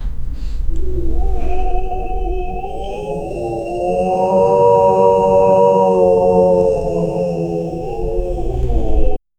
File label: the sound of the wind, human vocalizations